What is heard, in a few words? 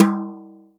hq high tom drum c key